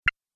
Interface Sound, electrical button

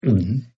Gulp, Eating; Partners In Rhyme

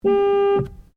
Car Ford Focus Horn Long Interior; Ford Focus hire car; Vehicles; Cars

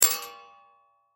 Bullet Shell; Bullet Shell 223 Drop Metal Catwalk Ring; Drops (Sound Effects:Objects); Blastwave; Warfare

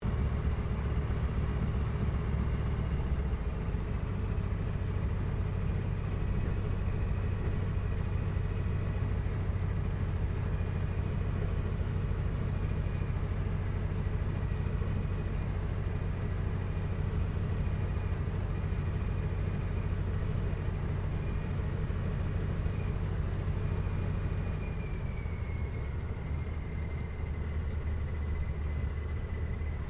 AIRCRAFT CA-13 BOOMERANG TAXI INTERIOR; Airplanes (Sound Effects:Vehicles)

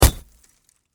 Impact Bullet Windshield Hit Glass

